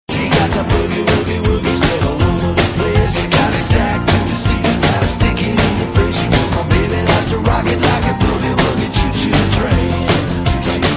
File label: boogie woogie choo ringtone